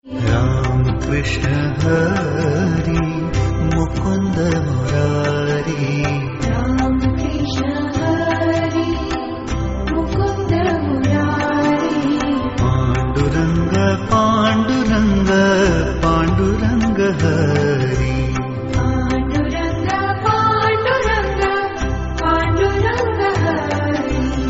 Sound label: Marathi Ringtone